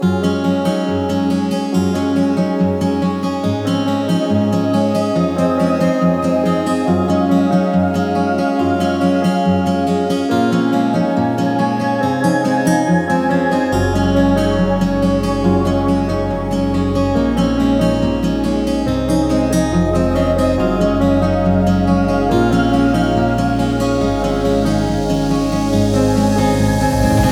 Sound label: music background looping